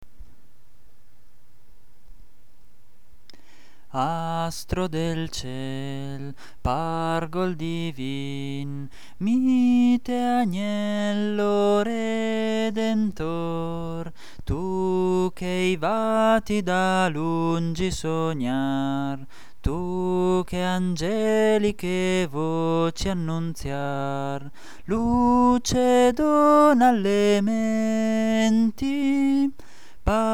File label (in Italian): voce soprani; astro del ciel